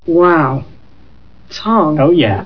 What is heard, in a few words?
tongue